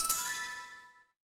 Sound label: feedback success